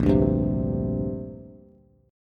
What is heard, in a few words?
Bb 6th Add 9th Bb Bass; Bb6add9 strummed